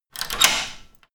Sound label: locking wooden door